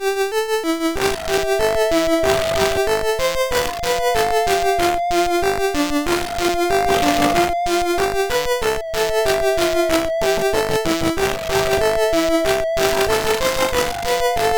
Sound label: sid, CSG8580R5; Shoot Him Up